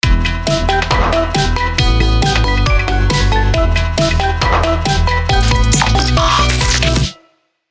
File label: drums